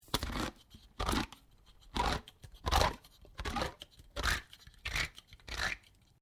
Can Opener Operate; various metal cans; Metal (Sound Effects:Materials)

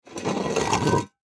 Metal Pot Slide, Foley Metal Pot Slide Full; Materials; Blastwave

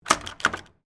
Padlock Shake, Foley, Padlock, Shake, Movement; Twice

